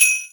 sleigh bells; xmas; Garry's Mod; tf2

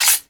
katana draw

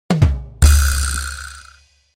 QUAKE EARTH DEATH badabum tsss; incredi pack ragmod

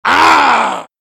knight pain; vo; tf2; garrysmod; halloween boss

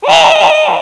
throw jelly

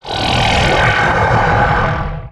teleport receive; weapons; rescue ranger; garrysmod; tf2

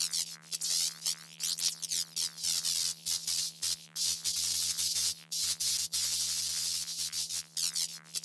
crit power; tf2

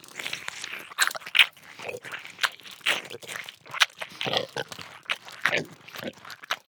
eat sfx; lin gang eater

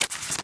quest folder open

